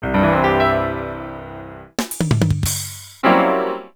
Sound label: boot tune; IP22 Indigo2